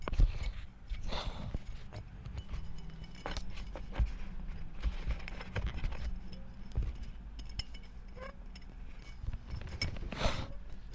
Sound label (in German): draht